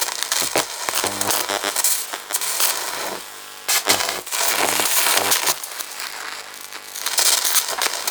Door Malfunction Sparks Loop; Tauron; Warframe; Betrayer

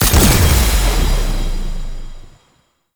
UI Foil Mod Fusion Achieved; Mods

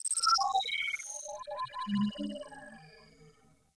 Beep Short, Ambience Interior; Warframe; Orokin

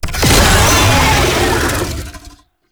Tank Infested Vocal Pain; Warframe; Lasrian Tank

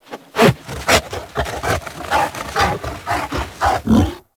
Kubrow Tame Idle Stand Back Rub Roll A; Warframe

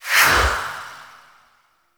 Wisp Movement Dash; Warframe

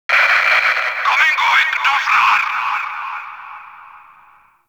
Interior Notification; Warframe; Grineer; Fortress; Ambience

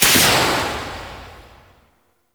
Swarm Missile Launcher Fire 2D Tail; Warframe

